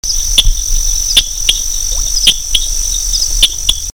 Dendropsophus minutus

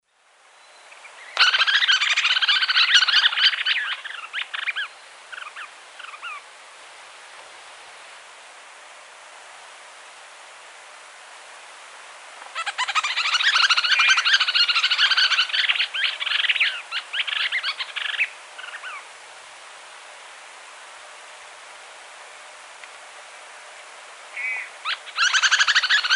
Suiriri suiriri affinis, Laguna Blanca

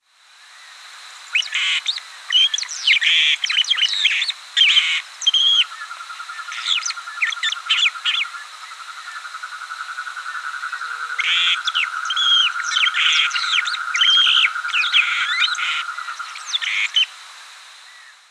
Pseudoleistes guirahuro; Di Tore

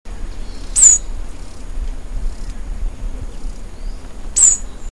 Basileuterus leucoblepharus pist

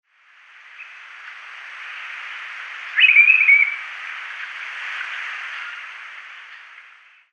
Antilophia galeatus variant song; Aguaranu

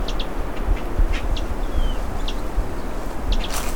Saltatricula multicolor

